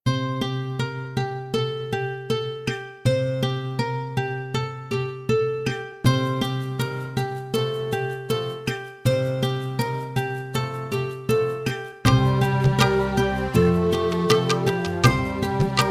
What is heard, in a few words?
Instrumental Santoor